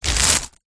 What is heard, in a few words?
household hand fan fold